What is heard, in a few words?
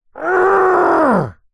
human male grumpy angry frustrated growl; zapsplat